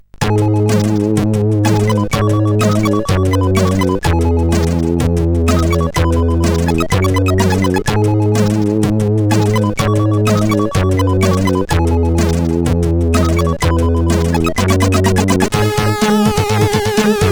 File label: music, sid CSG8580R5; Megahertz 01 music 3